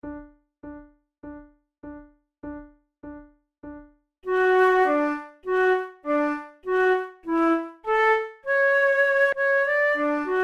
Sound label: duet